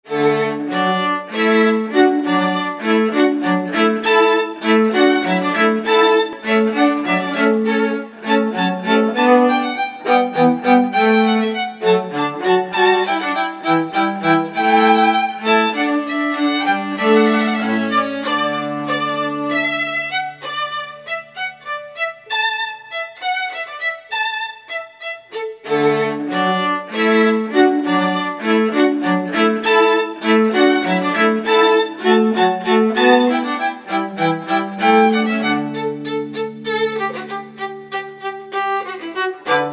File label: trio hornpipe